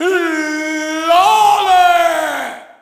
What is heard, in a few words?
Shout, audience; UFC Tapout 2